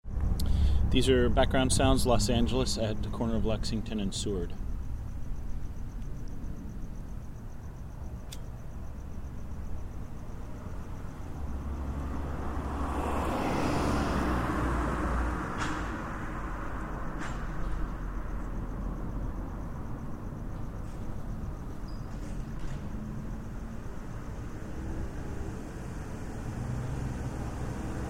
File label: background street ambience light city traffic lexington and seward